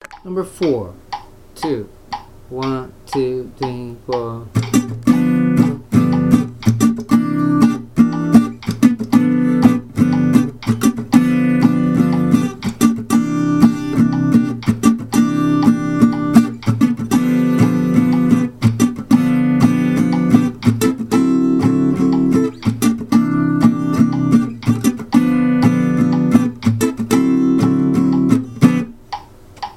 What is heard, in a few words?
claves clave comping 12 bar blues; original clave